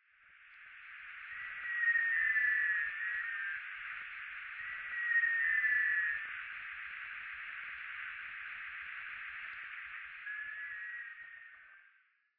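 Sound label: black scoter, notsuke; koe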